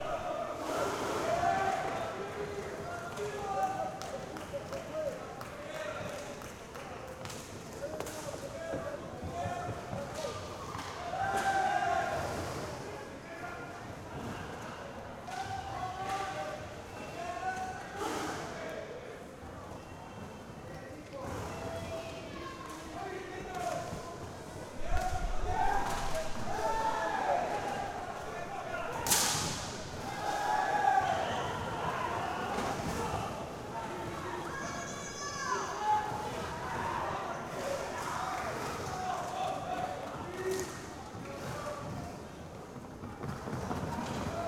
Masculine League Match, Roller Hockey Match Ambience